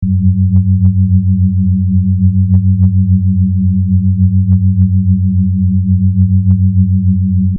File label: synth tide, 23 octaves